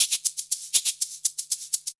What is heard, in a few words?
120 SHAKER; Best Service Advanced Media Trax Modern Composer